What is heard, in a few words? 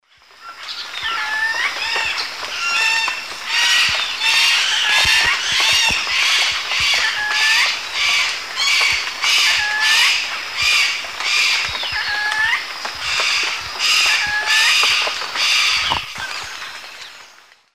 Daptrius ater, calls; S. America; Eastern Lowlands